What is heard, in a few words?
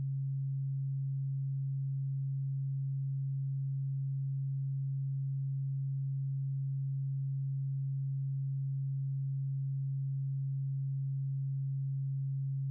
1361hz Sine wave loop; Zenboy1955